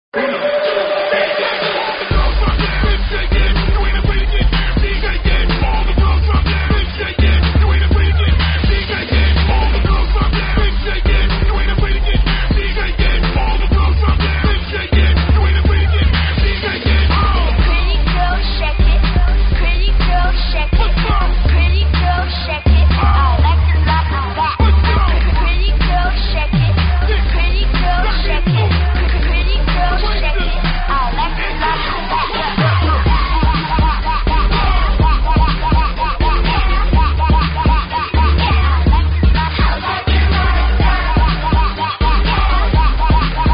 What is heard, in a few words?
funk; multitrax